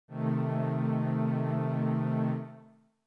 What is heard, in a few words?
A Diminished